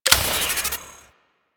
Experiment Pistol Reload; Crp Sent Experimental Pistol

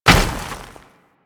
Step Snow, Footsteps; Enemies; Warframe

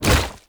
Tank Infested Arm Move Fast; Warframe; Lasrian

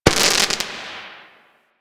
Firework Crackle; Fireworks; Warframe

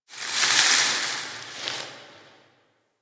Tower Branch Disturbance; Warframe; Quests; TheNewWar; Ambience; Cetus; Unum Tower

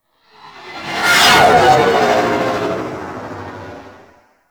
Ship Close Fly By, Engines; Warframe; Vehicles; RailJack; RailJack Enemy Ships; Grineer; Engines Generic

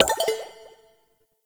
Messenger Window Close; Ambience; Warframe; Nineteen Ninety Nine

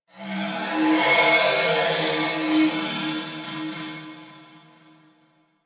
Ship Energy Moan Texture; Ambience; Warframe; Corpus Ship Remaster

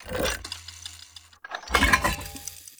Bomb Pre Plant Foley; Objects; Pickups; Collector

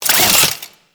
Necramech Repair Drone Idle Long; Enemies; Warframe

